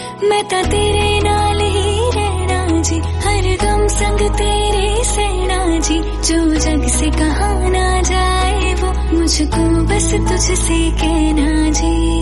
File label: Female Version